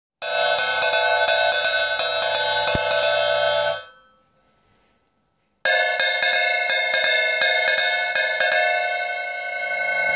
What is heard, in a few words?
CX500 Bottom, drums